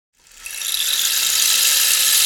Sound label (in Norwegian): S 100, regnstav av kaktus 100 cm; regnstav av kaktus 100 cm o 4-6 cm